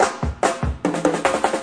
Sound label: 147 sunnyhoney break; 141 to 160 bpm